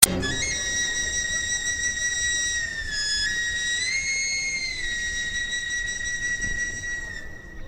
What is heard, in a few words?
Sms Tune Chinese